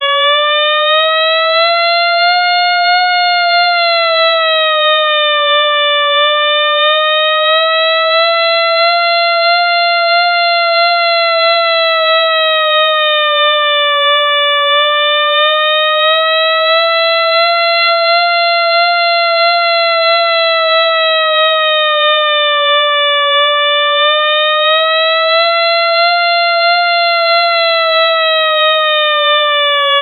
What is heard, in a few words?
weather audible alarm